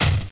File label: bam; THE REALM